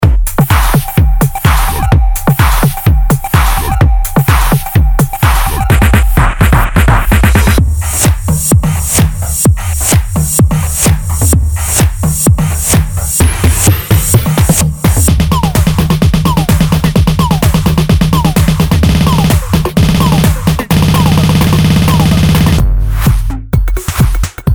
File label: house drums